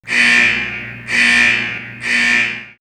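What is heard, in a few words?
Alarm, electronic fx; X-Static Goldmine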